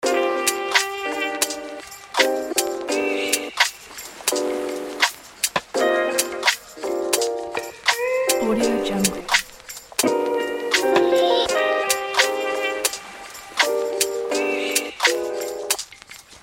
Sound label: lofi summer chill